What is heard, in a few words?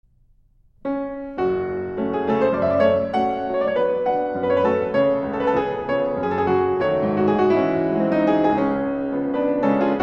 piano